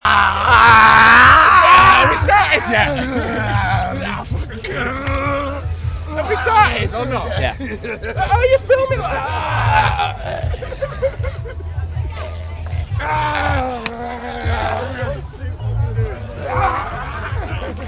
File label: zombie groan